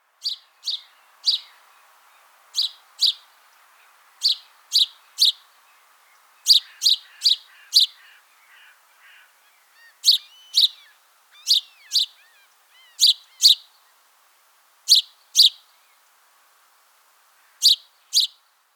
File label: house sparrow passer domesticus; grspurv